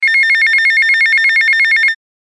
Mono Tone

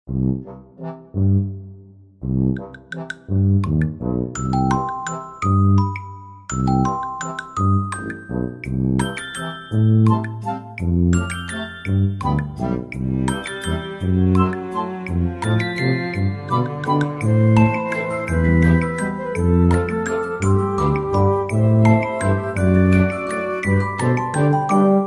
Cute Sound

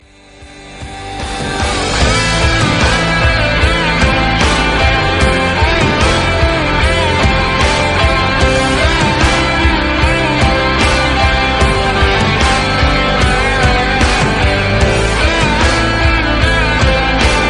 Guitar Solo

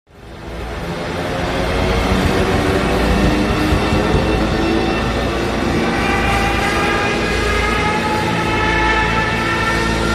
Stuka Siren

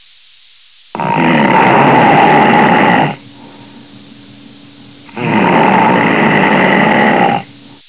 Snore

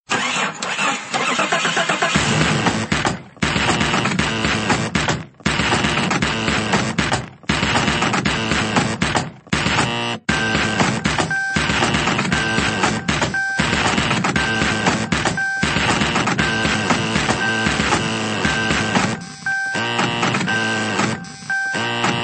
Techno; Jeep

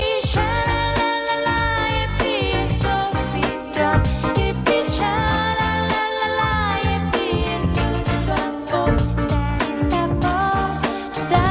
Shalalala; Full House

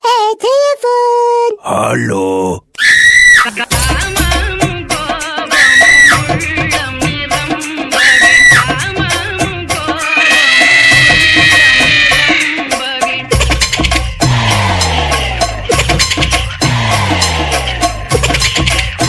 Girl Screams

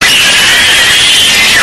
Scream babay

